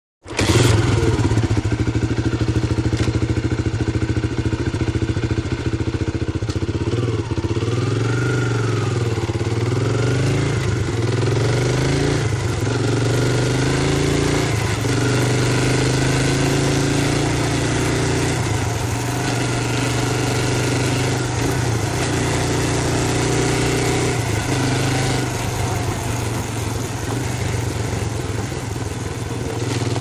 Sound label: Up And Away Riding On. Various Passages Of Fast Traveling With Occasional Slow Down And Gear Change Downs, Finally Bike Slows, Stops And Switches Off.; trials bike; Motorcycle